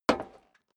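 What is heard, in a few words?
step boots metal dry single right, footsteps; schritte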